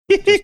hee hee

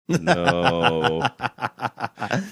laughing saying no

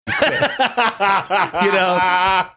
laughing saying you know

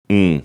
"mmm"

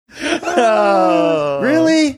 both sighing saying really

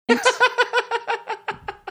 laughing

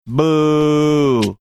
boooo

